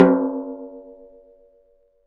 Snare Drum; Percussion Samples

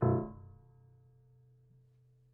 Prepared Piano

